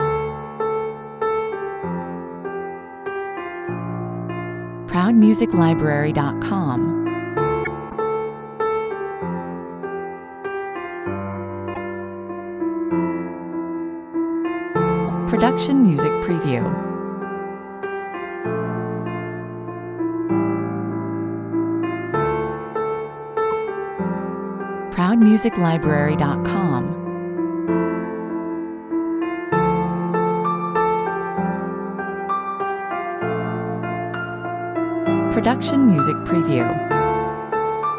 piano version

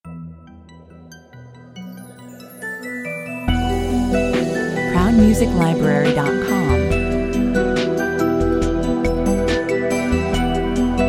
blue waves

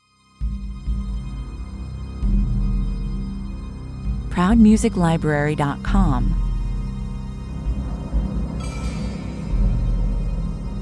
mystical suspense 107 bpm

